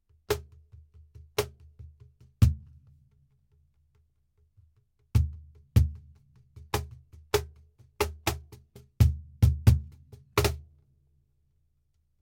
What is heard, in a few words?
cajon flamenco; esencia; negro